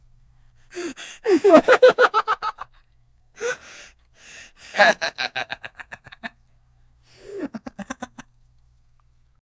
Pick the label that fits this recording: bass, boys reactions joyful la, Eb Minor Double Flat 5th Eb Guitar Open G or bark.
boys reactions joyful la